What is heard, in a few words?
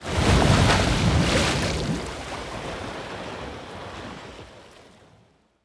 ambient battle; World Of Warships